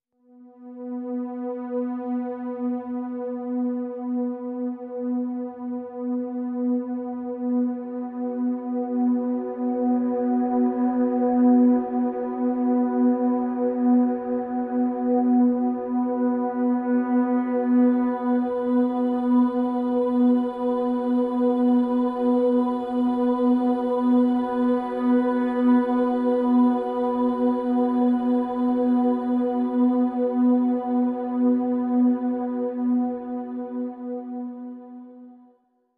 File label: Pads Vapors B